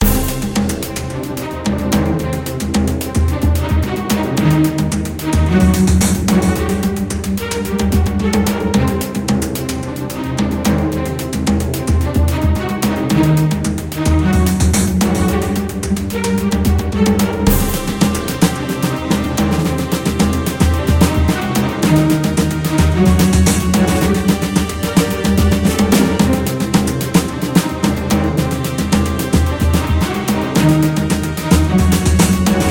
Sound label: Battle Music; Rezrog